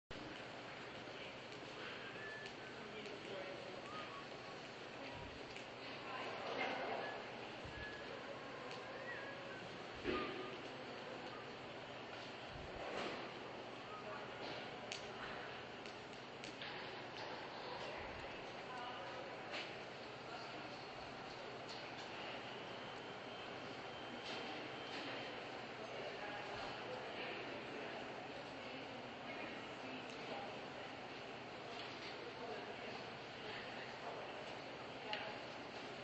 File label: bus terminal ambiance; soundscapes